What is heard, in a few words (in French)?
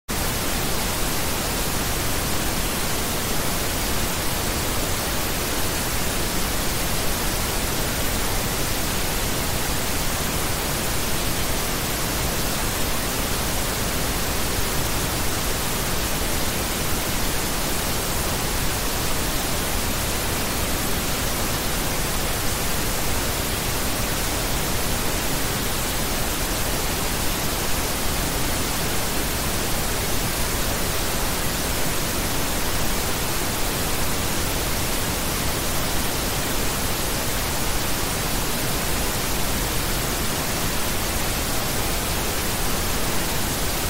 bruit rose; Etude détaillée des acouphènes